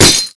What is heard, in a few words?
bullet light ballistic solid glass close; Titanfall 2